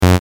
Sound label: blip